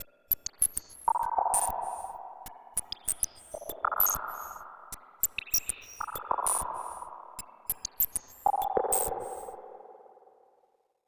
Overlay Tone

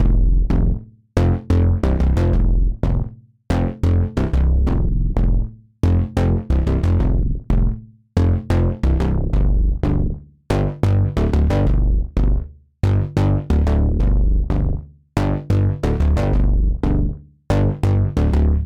Poly Bass

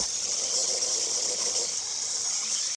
amb down; GONNER

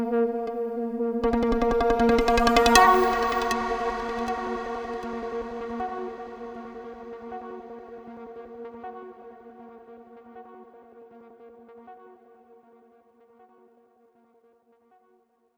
Tone

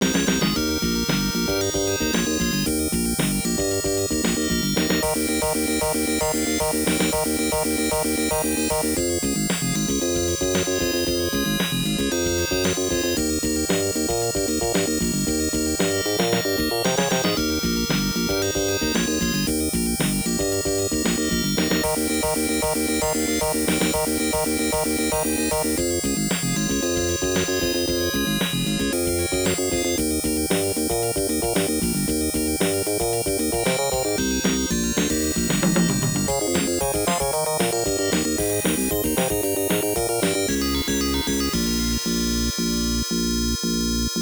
Round 5 BGM; Gemini Wing; X68000

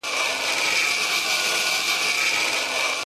Rail loop

